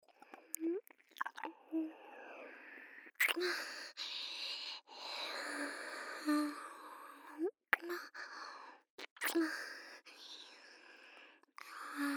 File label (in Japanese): 左耳舐め; アドリブ集